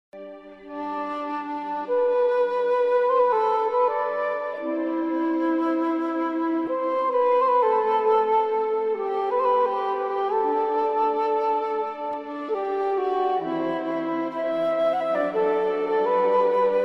soft flute epic start

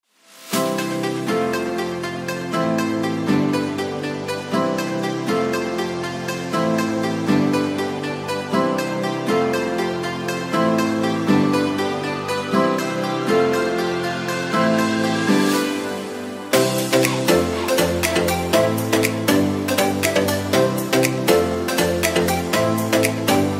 Music Happy faint girly peppy cheer Hey; This is our dance-club right inside the main school-building of our realm.

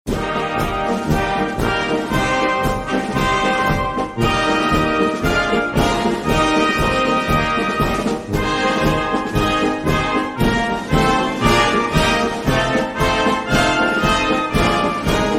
Anchors Aweigh instrumental, parade marching; Welcoming Square

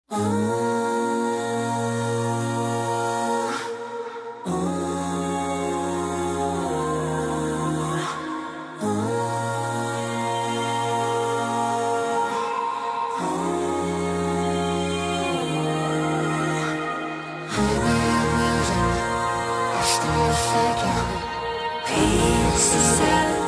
male with female duet

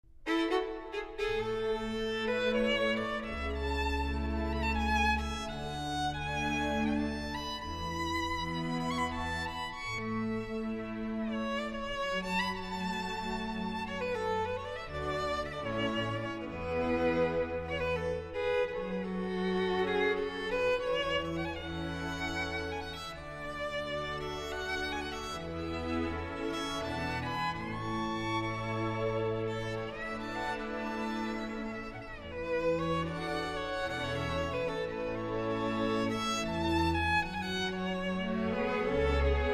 Strings Waltz

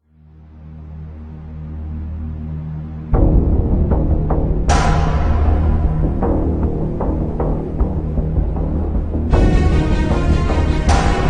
Epic Score; for assassin pods ICAPs

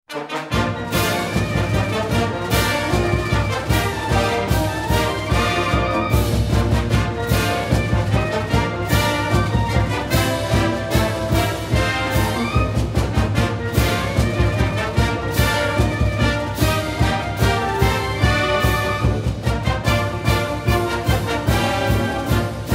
Fight Song; Missouri Fight Song; University of Missouri

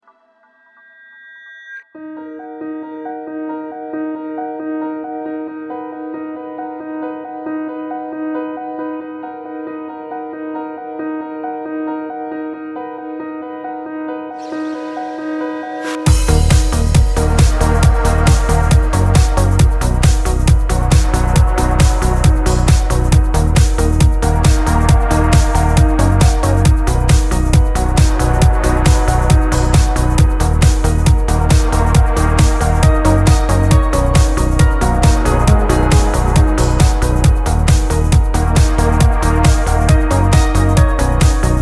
trance y meadows y canyon raves

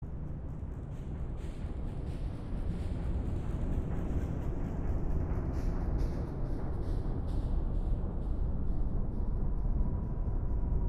Music Ambience; Hellfire Peninsula; World of Warcraft

